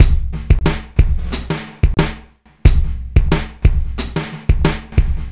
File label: Club Tip, R&B Drums